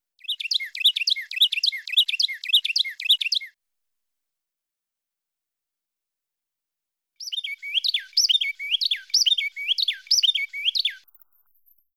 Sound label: Carolina Wren